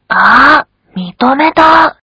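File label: guitar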